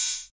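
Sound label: Korg KR-55 hi-hat open; drum machines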